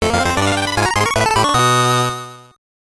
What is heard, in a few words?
Fanfare; Legend of the River King 2 gb